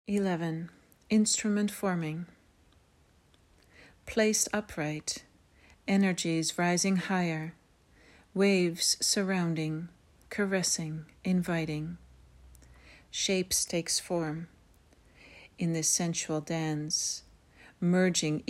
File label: instrument forming